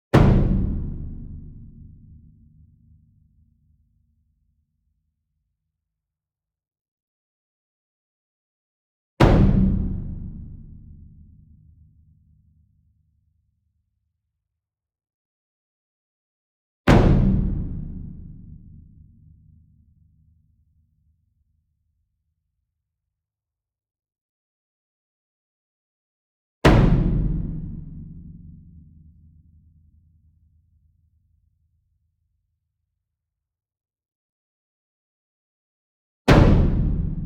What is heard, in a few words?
ENSEMBLE DRUM Bass Drum Concert Hit Medium; boom library; Cinematic Strikes Construction Kit